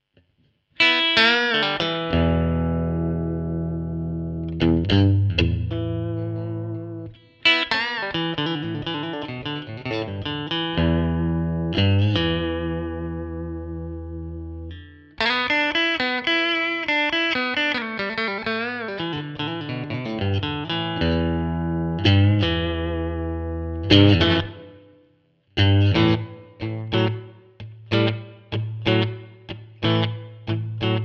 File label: Clean Bridge - Fender Bassman 4x10, pickups; three Fedora pickups (6K each)